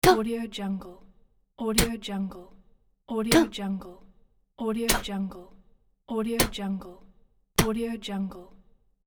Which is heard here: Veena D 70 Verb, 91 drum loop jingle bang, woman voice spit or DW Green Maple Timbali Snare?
woman voice spit